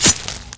switchblade hit body

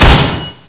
hit bod

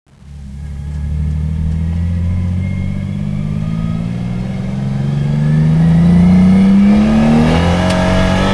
car sound

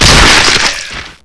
zom leg lost

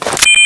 radio obtain

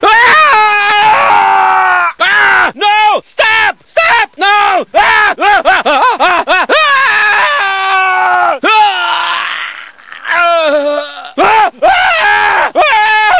screams

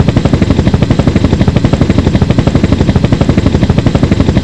helicopter rotor

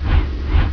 fan

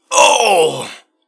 pain; doctor

